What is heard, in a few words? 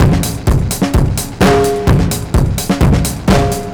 Funky Drums 128; musicradar